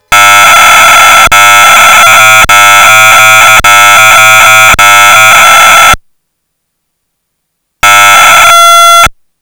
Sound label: aim 65 clock; computer